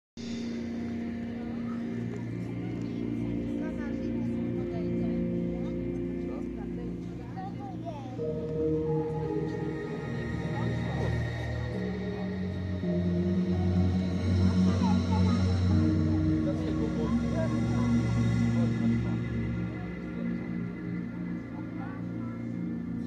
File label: Street festival